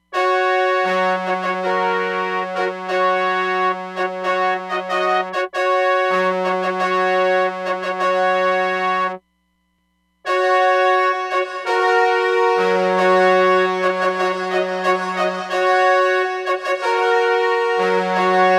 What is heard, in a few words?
Auditorium, Roland DEP-5; Factory Patches